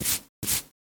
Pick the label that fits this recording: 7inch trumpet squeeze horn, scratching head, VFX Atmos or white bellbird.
scratching head